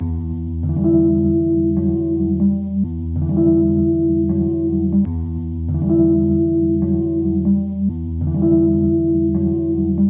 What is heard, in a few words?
E 95bpm Keys Melodic Loops